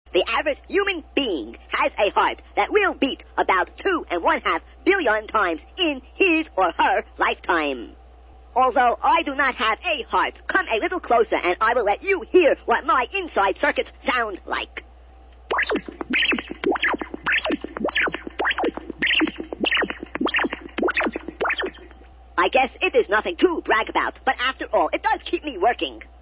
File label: heart